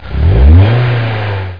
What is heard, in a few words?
BMW - Z3 Gas